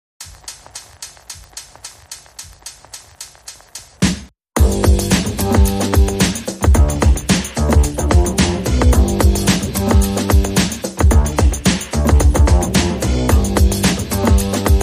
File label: Drums and Bass